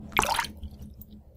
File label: sink Water; spookonomics